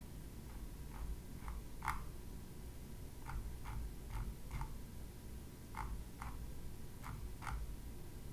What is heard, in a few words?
mouse wheel scroll sound; egret prettie